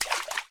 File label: water step; Golden Crayon Sounds